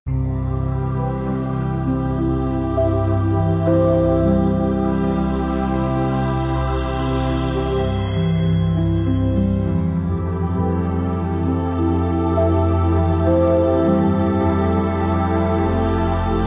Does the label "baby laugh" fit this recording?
No